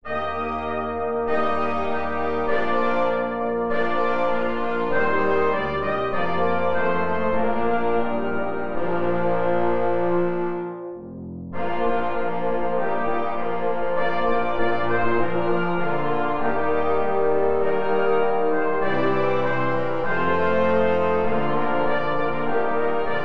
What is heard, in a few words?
brass; Nepobedimoe nam dano znamya